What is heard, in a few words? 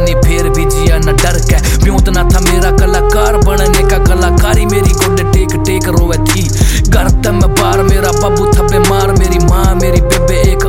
Ringtone, Punjabi